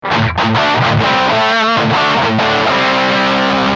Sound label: standard guitar riff